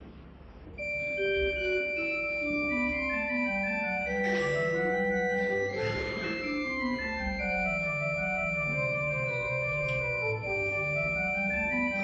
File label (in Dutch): orgelspel psalm 122; assen